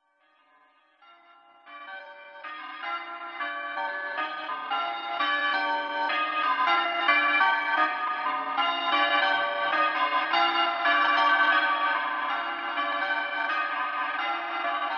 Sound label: bells at 80bpm; holne; Sound design for the opera composer: concepts and methods; Her face was of flowers audio cues